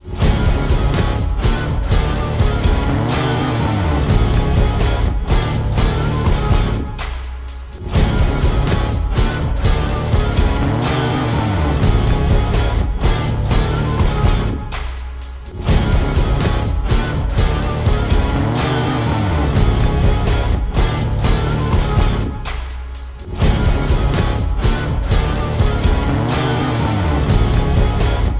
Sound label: Eastern Guitar Thing